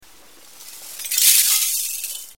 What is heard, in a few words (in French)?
Verre